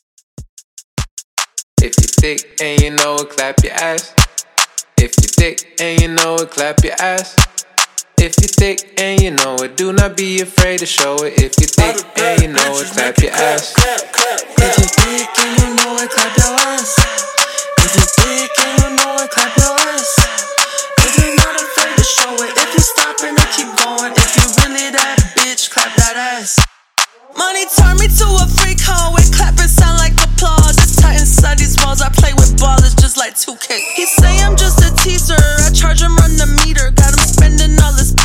75 Bpm; Dj Intro Outro

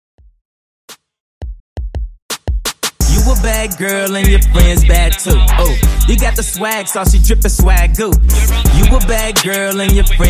Break In Redrum; Clean n Dirty

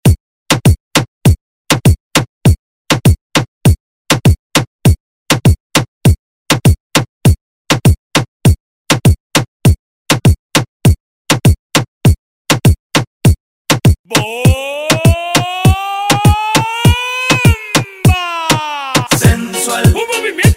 Moombahton Redrum

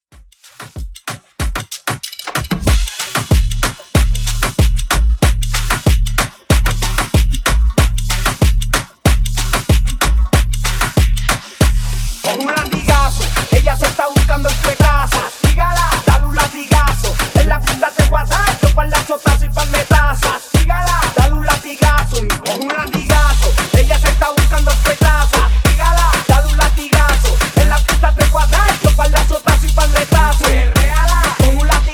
Moombahton

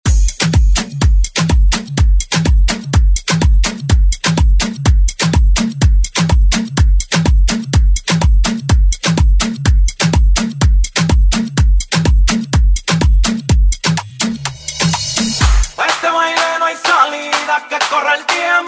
Afrobeat Redrum

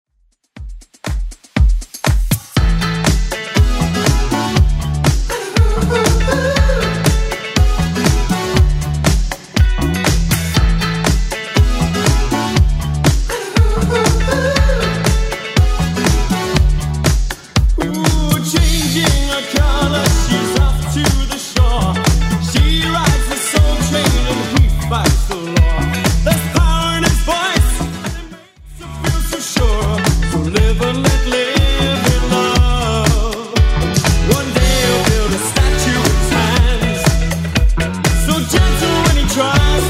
80s Club Edit 120bpm

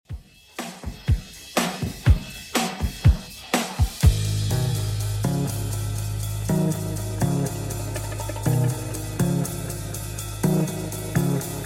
90s Redrum 122bpm

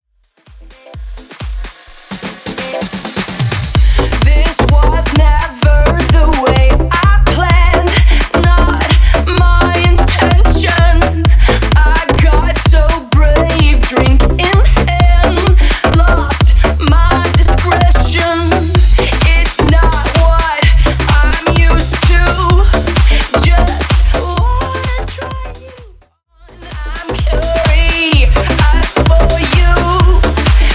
Remix 128BPM; Botleg

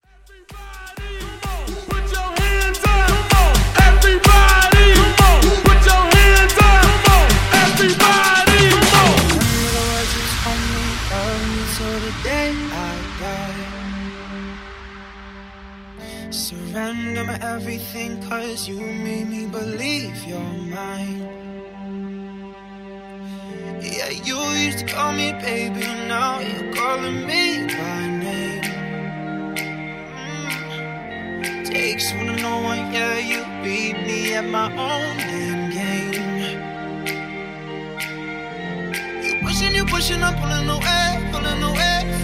Top40 Bounce Banger

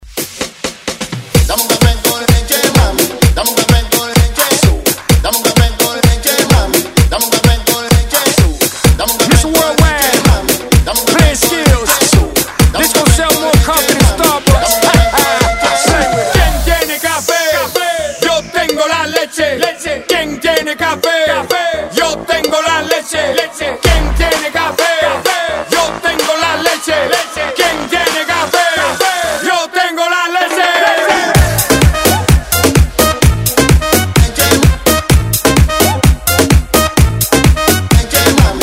Latin Dance; Redrum

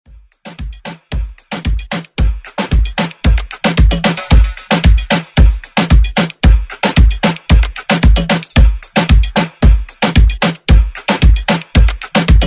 Afro Moombah; Redrum

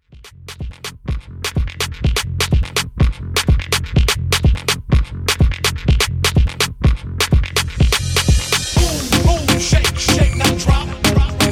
Party Intros DanceHall 125Bpm

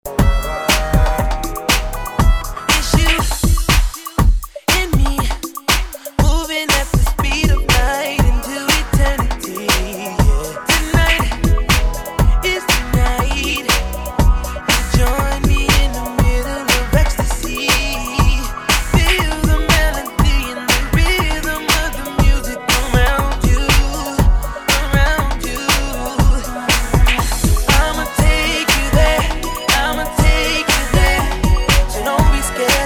Classic Hip Hop ReDrum

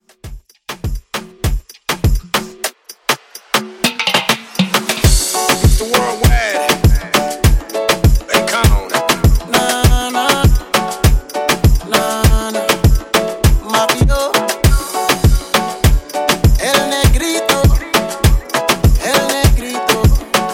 Reggaeton Redrum; Remixfreakz

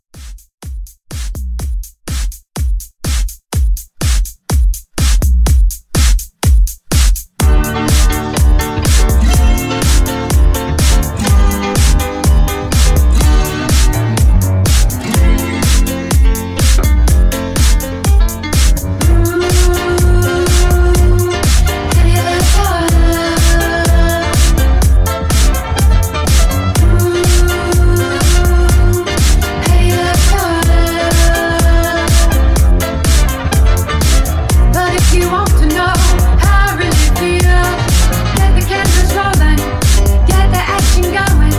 70s Dance Redrum

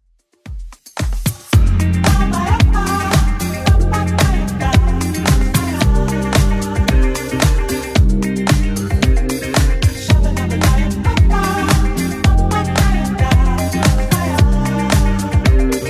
80s Club Edit 112bpm

